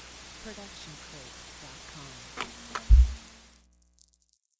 TV Turn Off with CRT Tube Noise and Ground and White Noise; SoundsCrate